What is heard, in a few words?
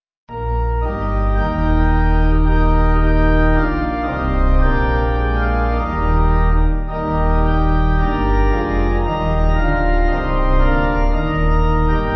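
Were You There, Pipe Organ; Douglas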